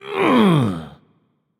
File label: grunt lift; smite; raijin